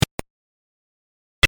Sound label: noise